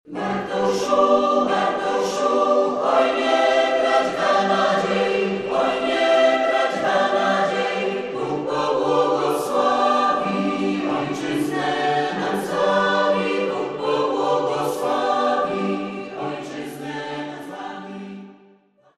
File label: acapp